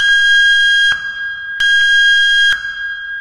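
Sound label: alarm beep loop; video games; ambient; source engine; portal2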